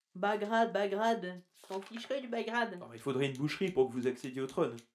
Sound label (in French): Acana extrait reverb Lexicon